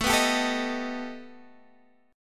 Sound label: B Augmented 7th A